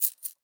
Coins Double Payment Metal; Nova Sound

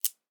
Revolver Pistol Trigger Release; Nova Sound

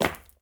Boot FootStep; Nova Sound

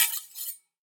Metal Knife Scraping; Nova Sound

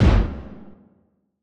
Arena Stomp; Nova Sound

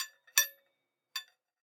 Holding Metal Pipe Foley; Nova Sound

